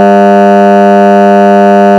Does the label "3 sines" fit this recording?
Yes